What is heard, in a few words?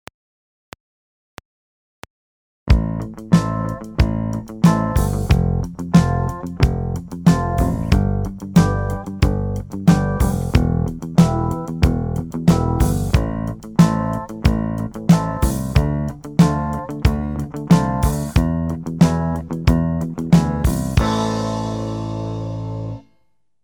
Walking, baixo